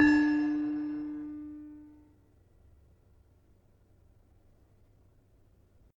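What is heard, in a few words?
Demung 4 D sharp, gravity harps tuned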